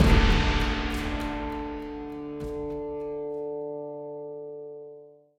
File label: Stinger; Naan Barrel End